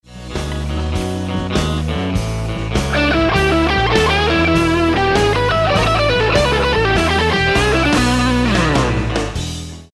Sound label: Lick, guitar